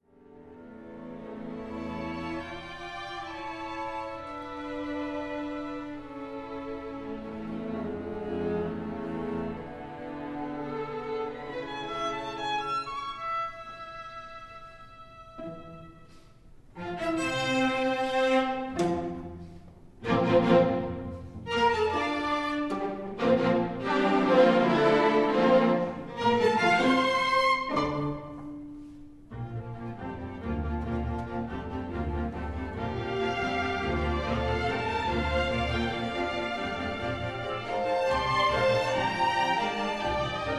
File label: Symphony String Orchestra